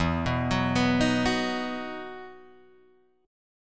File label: E Major 7th Suspended 2nd E down Guitar Drop D